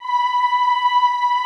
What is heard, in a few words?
OOH B4, Classical Choir; Best Service; Extended Classical Choir; OOH MIX